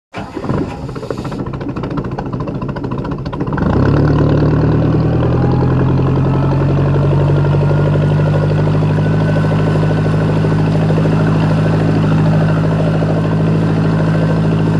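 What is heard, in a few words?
Boat Engine Start run, powerboat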